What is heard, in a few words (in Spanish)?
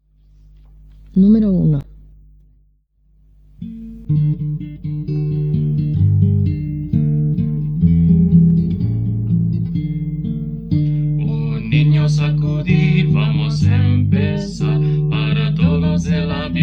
Coro, himnario niños